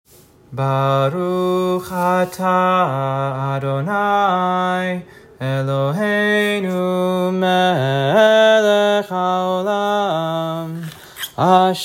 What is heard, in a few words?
Haftarah Blessing Before Haftarah Reading